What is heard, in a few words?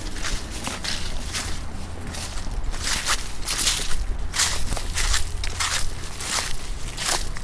Walk Leaves